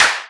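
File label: clap, Boss DR-202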